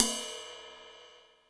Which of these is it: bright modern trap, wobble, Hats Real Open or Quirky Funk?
Hats Real Open